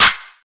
dry clap sample c key, Royality free clap single hit tuned to the C note. Loudest frequency: 3608Hz; percussion; single hits one shots